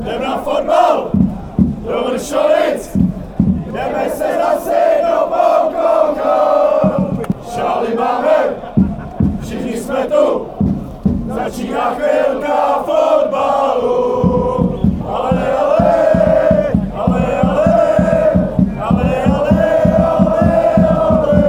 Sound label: allez allez bohemians fanchants